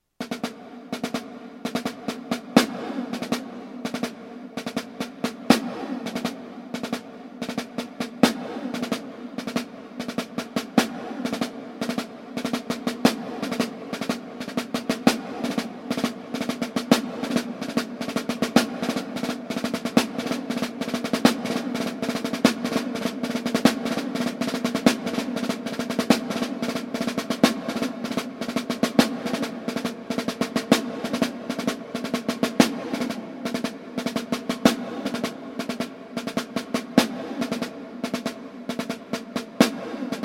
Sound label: triple ratamacue